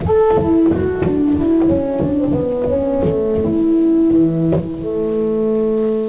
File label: Choralbass